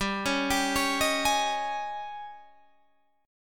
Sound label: Ab Major 7th Suspended 4th G